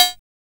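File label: cowbell, KR-55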